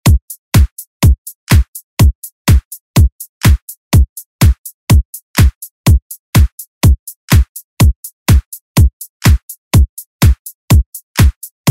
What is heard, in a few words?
Pop Redrum